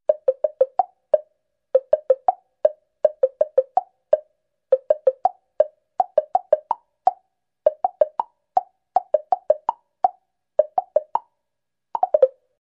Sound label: Extended dull woodblock melody